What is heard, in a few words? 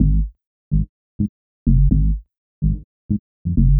126 G# BASS, Funky Underground bass loops; Loopmasters; Funky Underground Vol 2